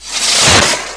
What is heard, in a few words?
LAUNCH